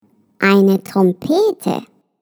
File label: TRUMPET